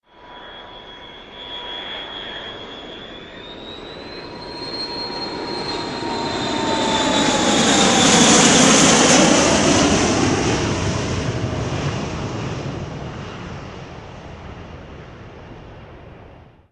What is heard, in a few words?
airplane